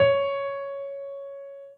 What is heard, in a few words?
soft hitwhistle